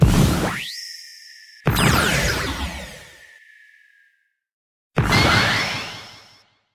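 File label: flight full sequence; sfx; mewtwo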